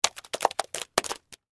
Splatter Mud Debris, Texture, Splatter, Mud, Debris, Drop; Drops; Objects; BlastWave